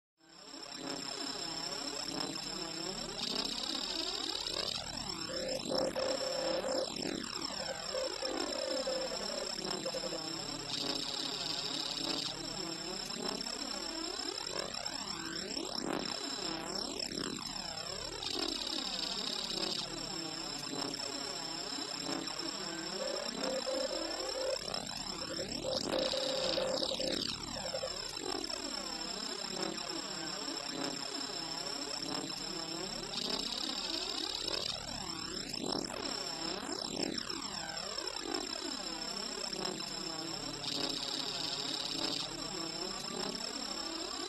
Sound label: Terminator Scan and pan vision sound FX; T-800; VRChat